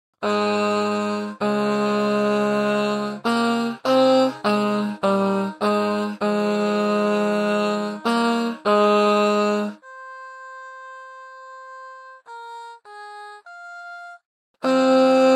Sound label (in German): Gute Nacht Tenor; Schumann; chornacht 2026 uebungstracks